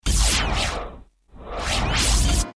Whoosh

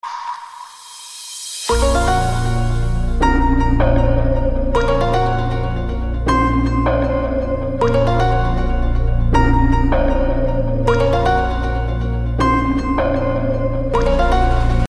ringtone; X FILES

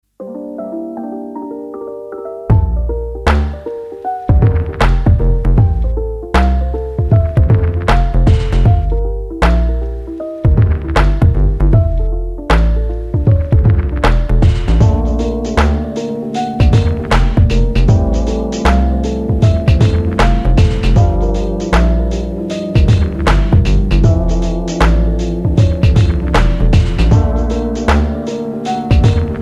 Synth; Fairy Fountain

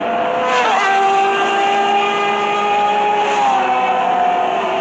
Yamaha r6 flyby

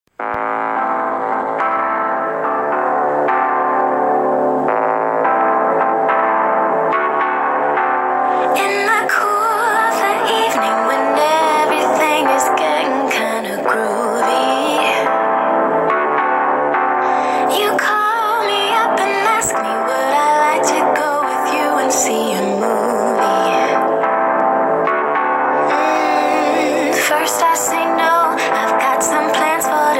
Spooky; Riverdale

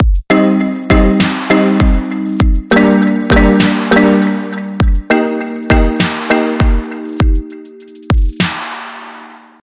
Tropical Trap

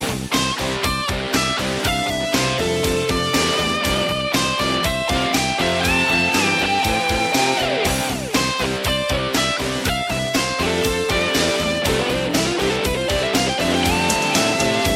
Fast Guitar Playing

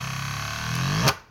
Eulers Disk

